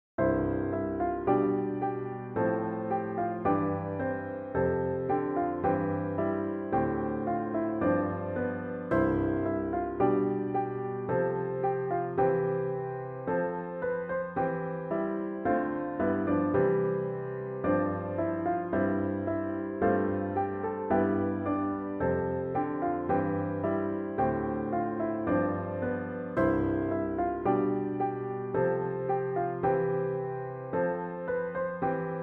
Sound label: Piano; Sing, Little Angels